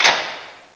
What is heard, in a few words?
tap